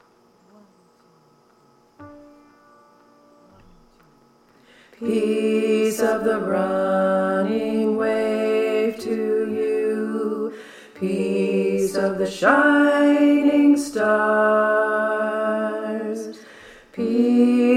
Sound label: Tenor; The Freedom Choir